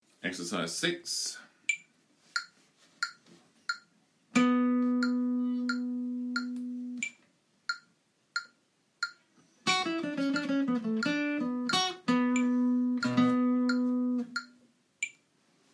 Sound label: Melody